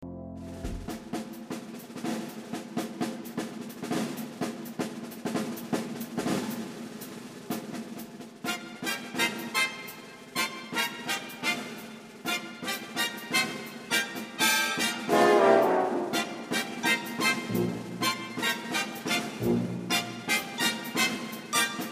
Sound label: brass